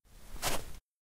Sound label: ice, footsteps